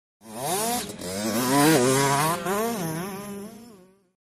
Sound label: Motorcycle Motocross; By 50 Cc, Cu Fast By L / R, Shot Cu.